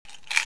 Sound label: Pills, clattering